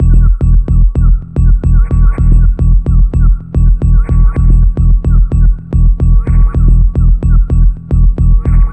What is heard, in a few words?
Trailer Pulse E 110 BPM; Hackerman; Ghosthack